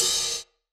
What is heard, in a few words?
DnB Drum Hit Ride; drumnbass 170bpm; Drum Kits; musicradar; Drum Hits A